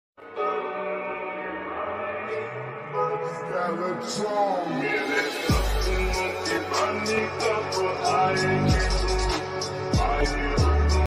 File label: remix lofi